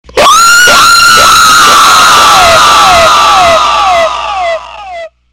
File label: Screams